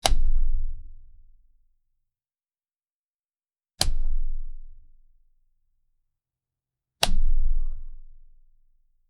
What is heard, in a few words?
subtle button press deep